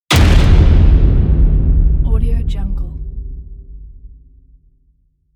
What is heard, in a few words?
cinematic strike; volkovsound